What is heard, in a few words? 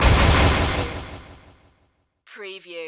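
Production Element Hit Delay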